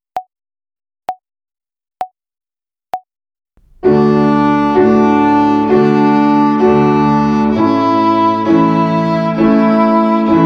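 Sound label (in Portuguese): Ginástica até o dedo 3 - Corda RÉ - Quartenária, Violino; Violino para os Pequeninos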